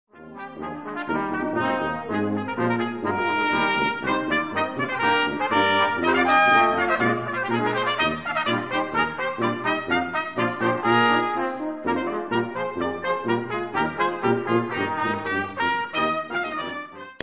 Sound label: etude in brass